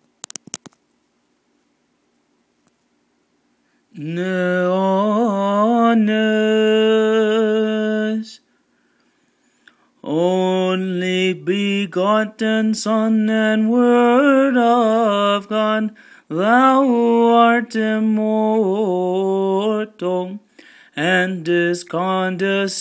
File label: only begotten son, byzantine chant; divine liturgy in byzantine chant